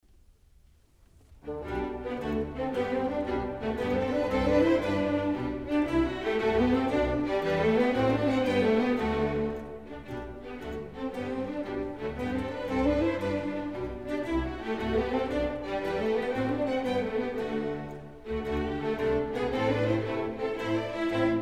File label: Water Music Suite